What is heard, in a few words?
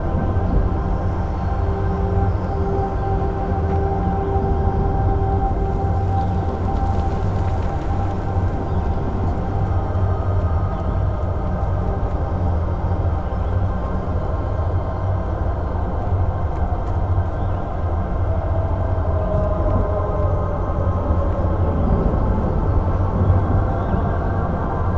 gateway hero loop; war for the overworld ultimate edition linux macos windows gamerip 2015